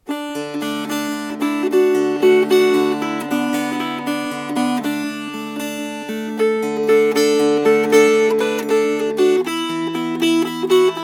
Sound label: Fingerpicked; Engine 143